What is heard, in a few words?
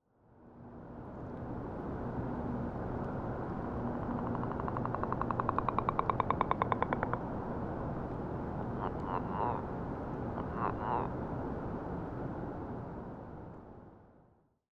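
leopard isolated, leopard frogs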